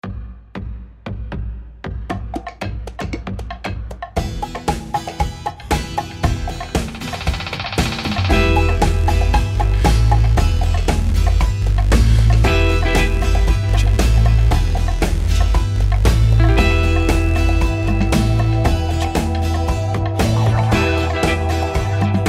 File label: ELECTRO